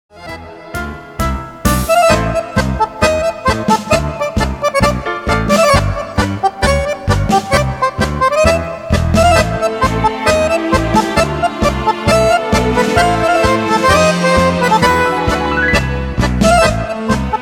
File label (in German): virituoses akkordeon